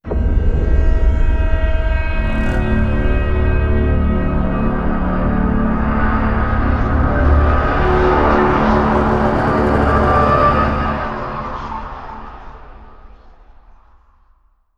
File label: Round Start; Zombie Zod; Meatball; Call of Duty Black Ops III